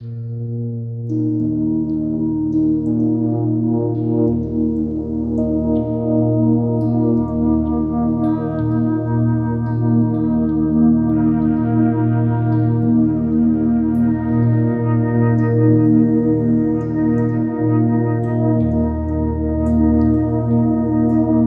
Lullabies Relaxing Music

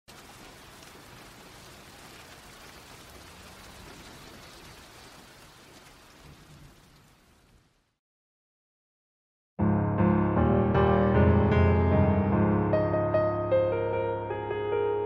Piano cover

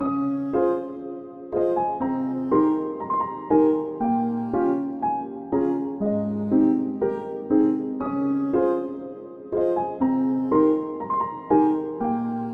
Without Drums

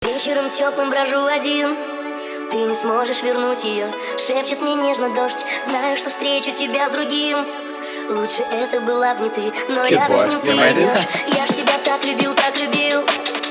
gabber, hardstyle remix

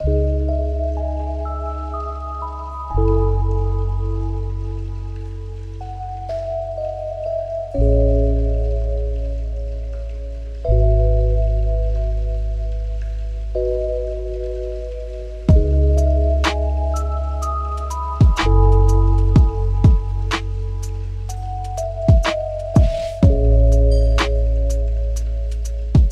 Lofi

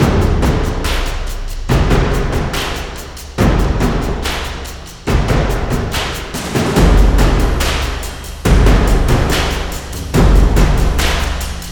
High Energy Drums

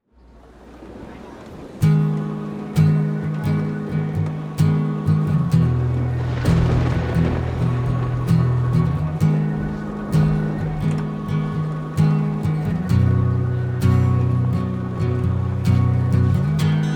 Acoustic

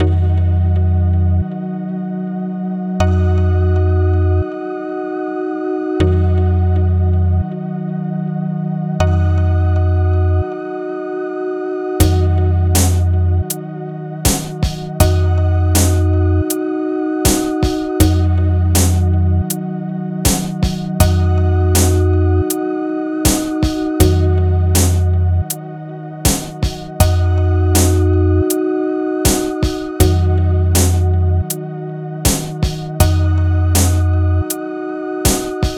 Instrumental Rap Hip Hop

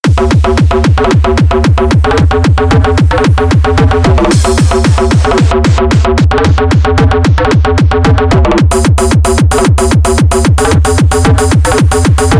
Russian Hardbass

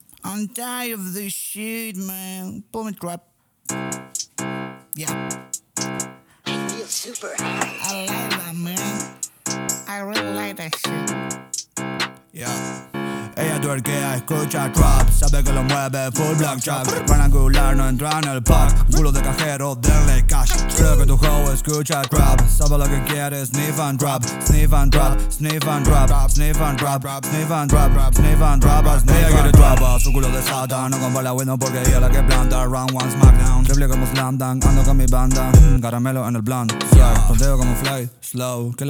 Trap

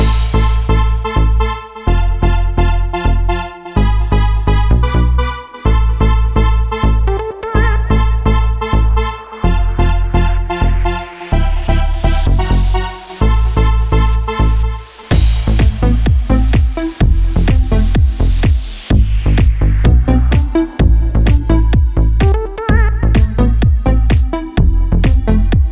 Instrumental

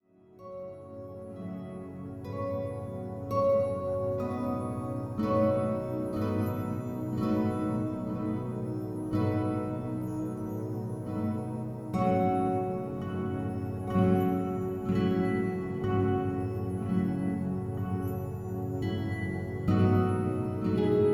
Instrumental; Relaxation

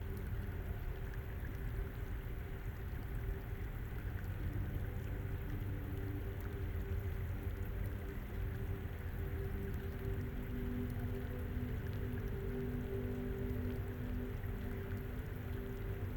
Wonder Waterfall

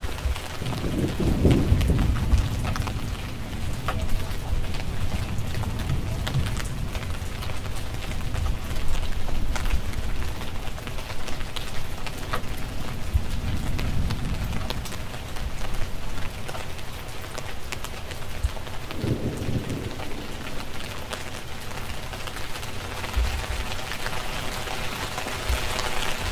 Thunderstorm Deep Sleep Storm

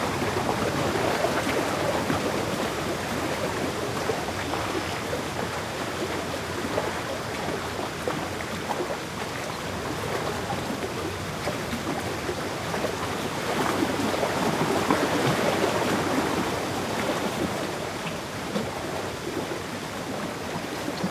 Lakeside Waves Lapping Birds

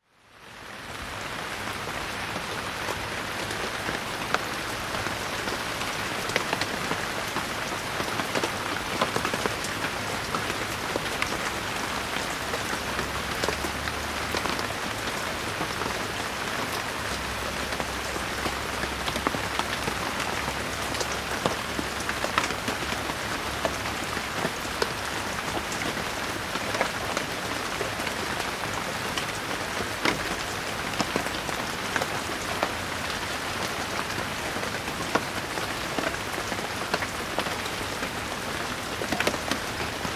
Slow Down Rain

